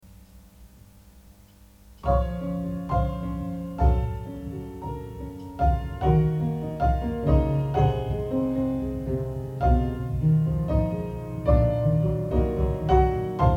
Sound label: old cassette